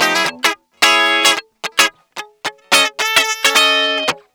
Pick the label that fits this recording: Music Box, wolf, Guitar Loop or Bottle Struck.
Guitar Loop